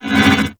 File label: Void Portal Rotate One Off